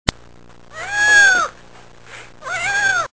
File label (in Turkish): kedi miyav; hayvanlar